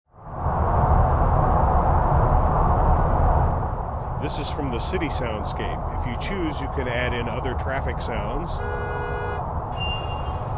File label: City